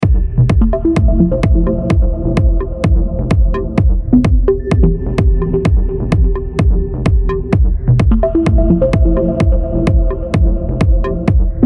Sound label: Deep Techno Chords And Degraded Melodics; Irradiated Earth